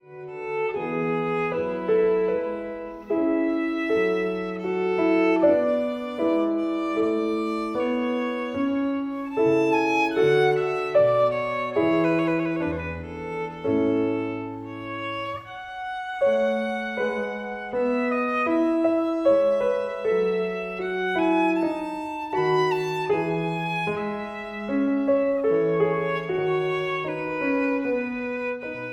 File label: VIOLIN